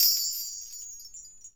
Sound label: BELLTREE